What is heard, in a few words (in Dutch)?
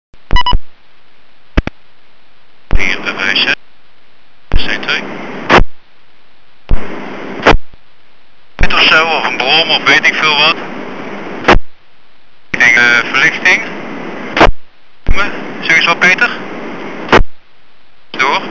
Sound Check Eerste Rit K1300S, BMW; Bike-to-Bike; inbouwklus